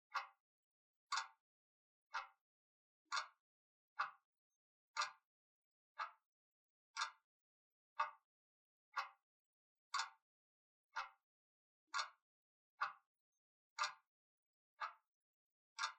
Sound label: Clock ticking Turning; ساعت; saat